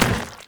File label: Zombie Run Metal, Footsteps; Warframe; Infested